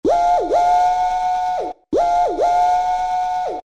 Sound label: Whoo-Whoo!; Dance; Roland SR-JV80-06